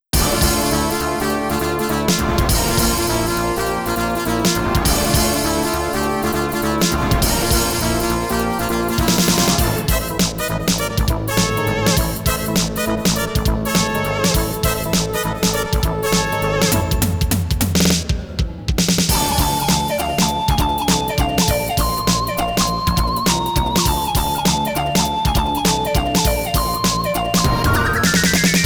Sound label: Midi Roland CM-64; Castlevania Chronicles Akumajo Dracula Original Soundtrack